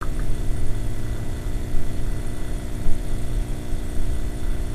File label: halogen light